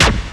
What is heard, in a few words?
RAVE; Rubber Chicken Software Sample Bank; RAVE CITY